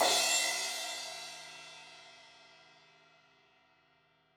Crash 16in edge, Cymbals; Deeply Sampled Drums

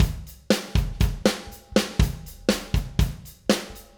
Acoustic Live Drum

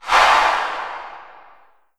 LH C808 -L, REVRB TR 9+8 LARGEHALL808

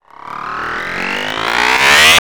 SPREAD OUT, PERC SWEEPS; ILIO; Trance Fusion

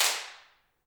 handclaps, Heart of Africa

